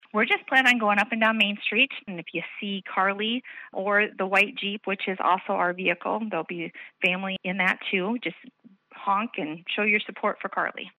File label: Honk